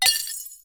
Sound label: broken glass